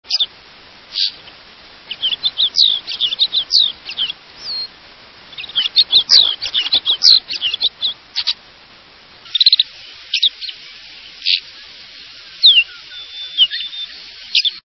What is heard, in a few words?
Snow Bunting